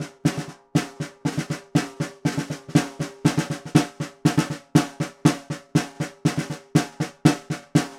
Vintage Ludwig Snare, Percussion

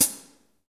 HH, Kits, Rev

